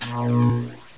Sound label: light on; amphetamine